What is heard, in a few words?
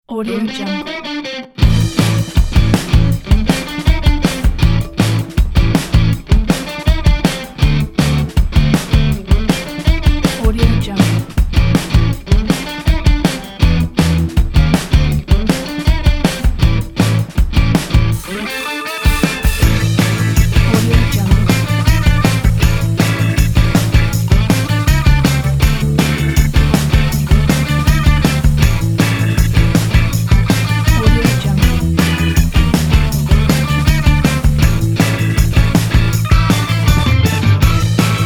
upbeat fun rock and roll